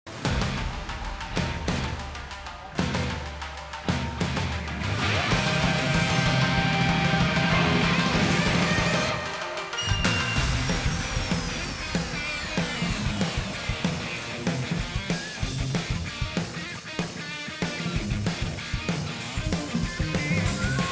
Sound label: Battle Boss; Type Jin